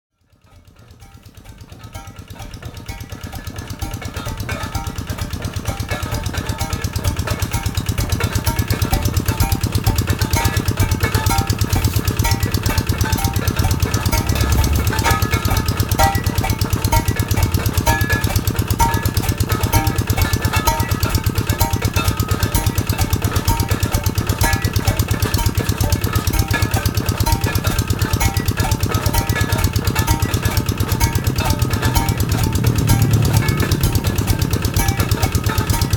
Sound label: Stone breaker, INDONESIA